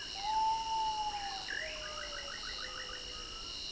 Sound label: Common Potoo